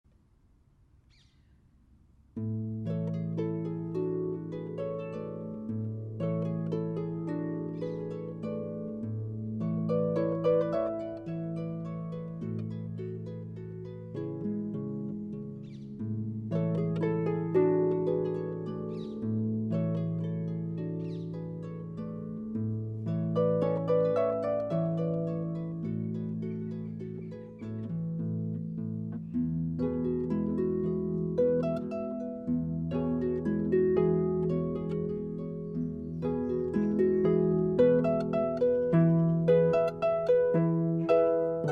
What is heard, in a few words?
Flower Duet Harp